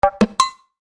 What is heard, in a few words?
Chime Percussion